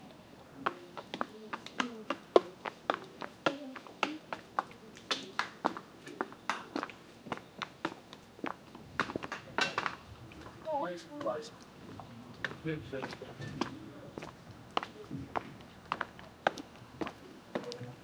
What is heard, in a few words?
crowd murmur people greet; tea